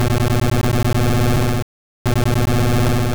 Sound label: Explosion